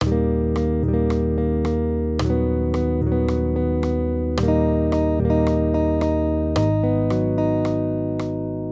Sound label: maj triads